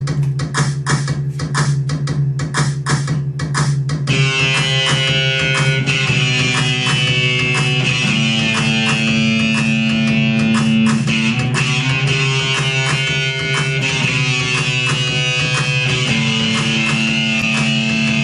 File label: rock; Joker 2019